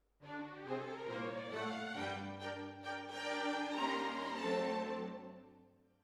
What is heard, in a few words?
Hall, Jazz for piano